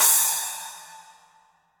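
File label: Cymbal Stick Open; Latin Percussion; Drums; Drum Hits; Ableton; Factory Packs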